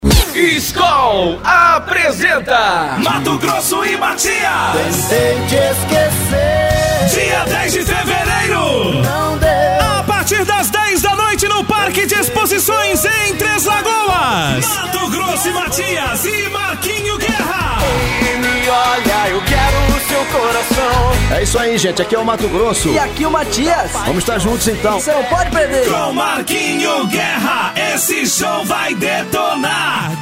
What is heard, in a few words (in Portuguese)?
vinheta